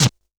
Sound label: Scratch, Sony DRP-2